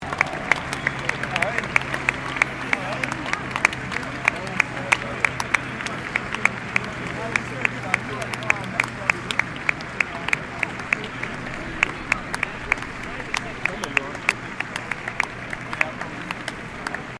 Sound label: Crowd Large Outdoor Event Applause, Outdoor event crowd; Human